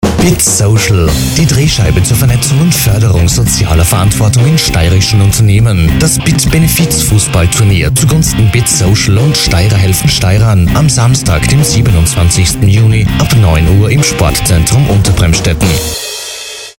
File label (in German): fussball; bit nemetschek charity cup 2009